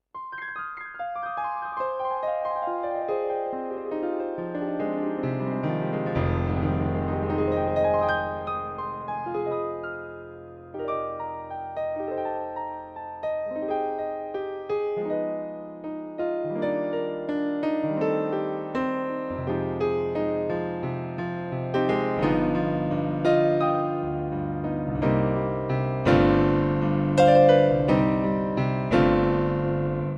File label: Triple Power